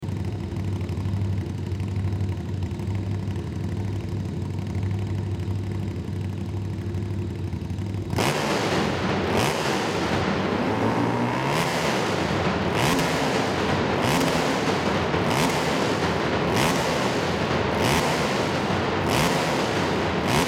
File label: KTM Duke 390 Sound